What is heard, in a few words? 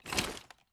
put away, mg3; tacz; daffas arsenal